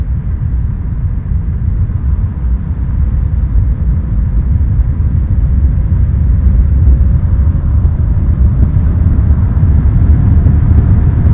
Sen416 with Popper; Windnoise

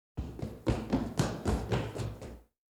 one person running; Soccer; Butterfinger Video Sound Effects; Soccer Running Chainlink and Huh

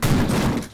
drum

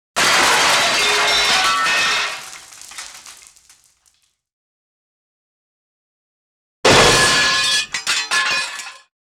impacts med glass; Asian Show Sound Effects

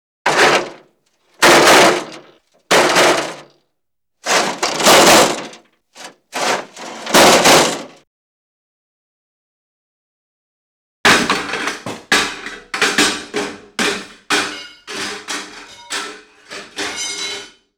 metal tumble crash; Asian Show Sound Effects

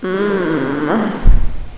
moan

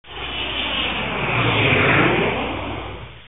jet pass

